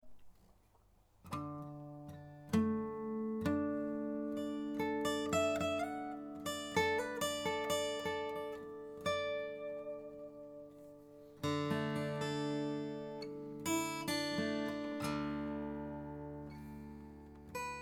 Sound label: drop d